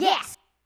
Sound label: Hardcore Breakbeat, 151; Big Fish Audio; Hardcore Breakbeat Action; Rhythms 4 Life 151 Ebmin